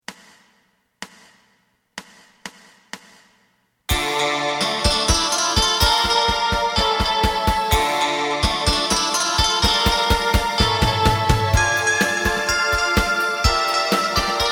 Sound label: bass